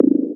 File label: Percussion Bm